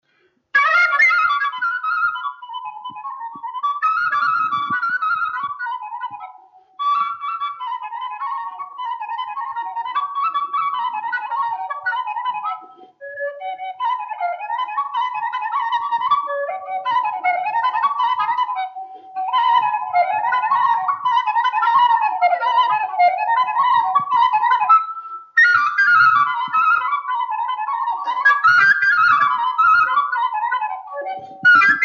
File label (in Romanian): Ciobaneasca fluier; Vaslui